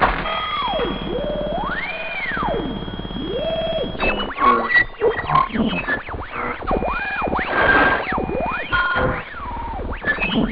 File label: radio